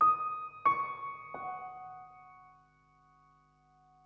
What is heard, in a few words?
3 notes